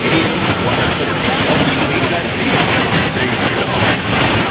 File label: whiz